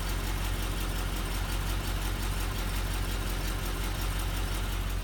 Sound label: rod knock slipped liner no belt, Land Rover discovery ii